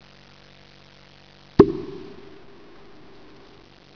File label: pop